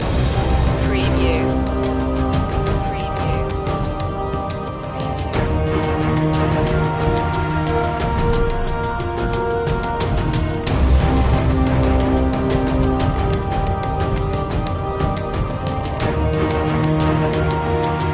loop

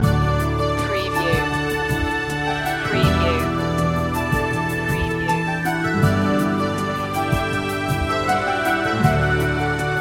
water loop; innocent

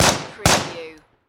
Machine Gun M4A2; warfare; Sound Effects Bible

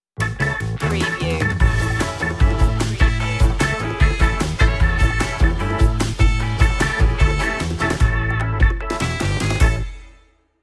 funny fight stinger, brisk

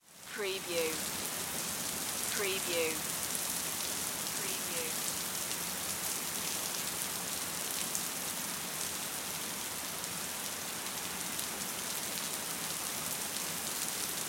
Rain Pour Splatter; weather; Sound Effects Bible

